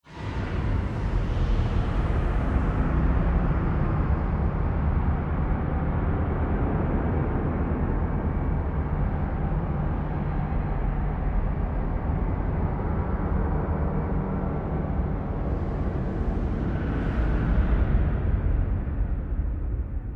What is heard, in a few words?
extend wet, vault bridge; Half Life Alyx; mechanical units; combine vault bridge